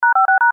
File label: DTMF TX LOWSPEED; Multilink 2018